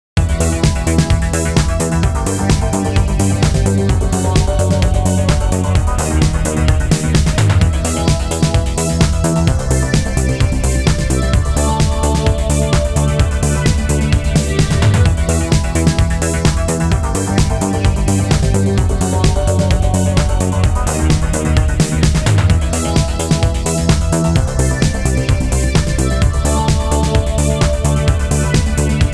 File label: Dance Loop